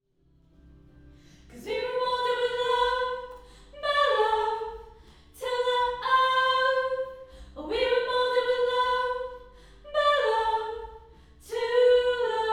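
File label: Choir Harmony High R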